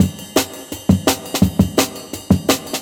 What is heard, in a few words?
170, Jungle Breaks; Church; Original Jungle Breaks